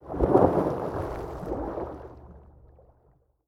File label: thunder and lightning